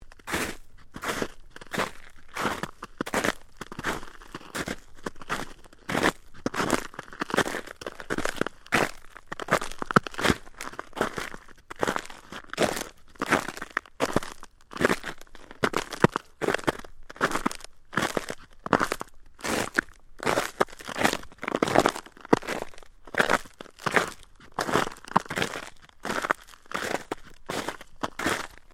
FOOTSTEPS SNEAKERS ICY SNOW SEQUENCE STEREO, Walking in sneakers or runners in light snow